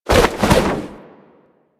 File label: Generic Spin Roll Whoosh; Characters; Warframe